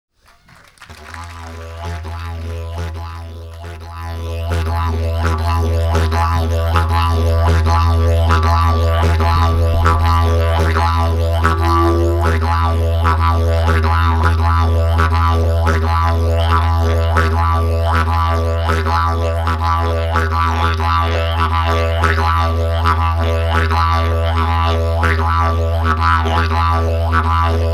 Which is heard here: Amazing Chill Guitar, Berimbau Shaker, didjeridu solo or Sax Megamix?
didjeridu solo